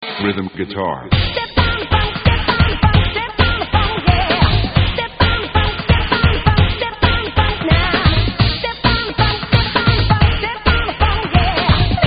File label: step guitar